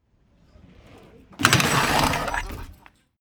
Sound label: bmx maneuver grind